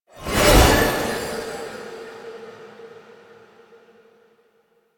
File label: Horse Appear; Foley Actions; Warframe; Vehicles; Duviri